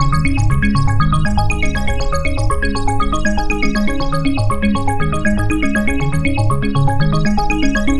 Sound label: Synth Arpeggio, Synths; Eclectic Electronica